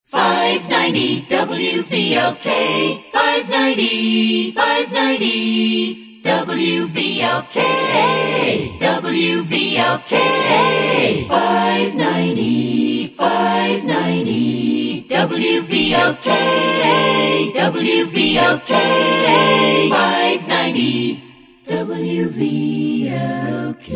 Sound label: Acapella Jingles; WVLK